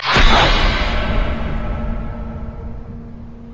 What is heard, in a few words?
tatsumaki; LoL; yasuo base